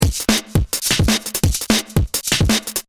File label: Beat 170, drumnbass